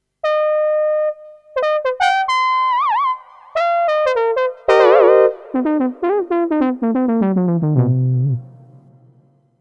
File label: Leadsynth